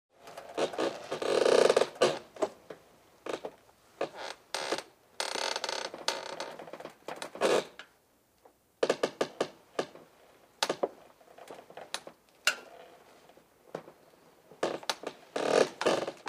Wooden floor creak

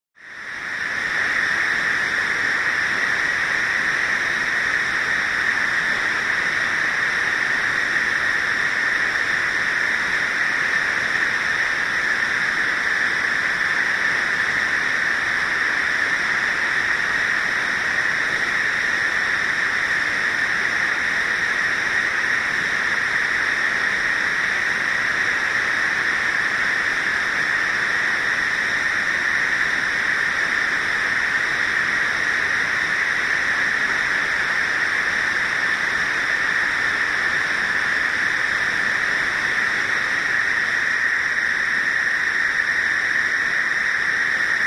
White noise

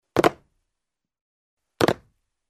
Jump on the wooden floor with shoes; steps

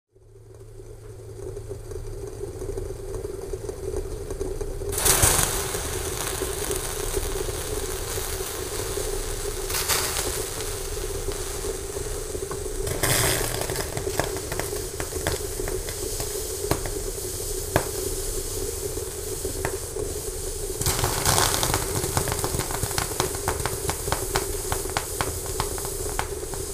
Water boils in a pot, lid rumbles

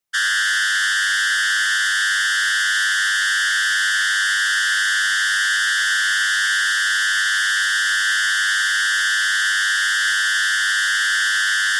Electronic signal

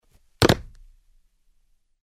Jump on the wooden floor with shoes

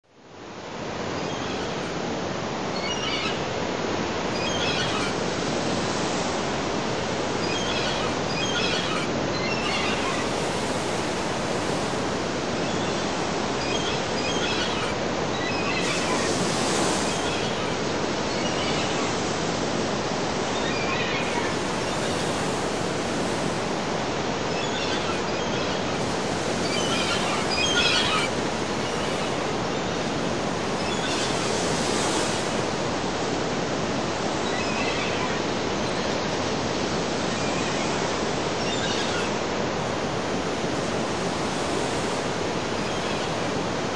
Waves of the sea, ocean, seagulls

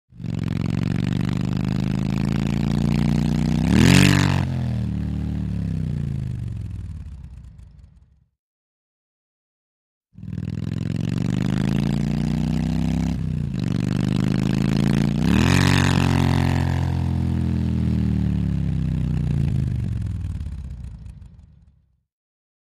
Riding a motorcycle

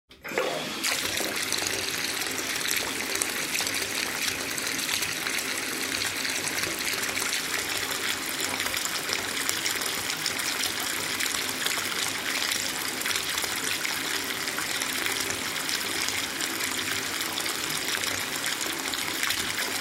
bath filling

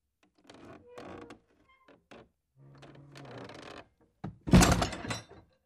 wooden door closes with creak

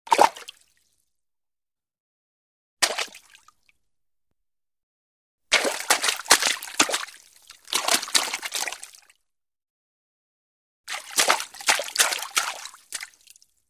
Water splash

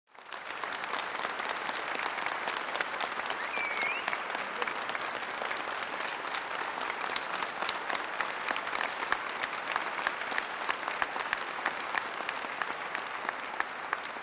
Applause in the concert hall; standing ovation

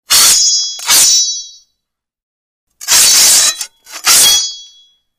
Two swords clash against each other

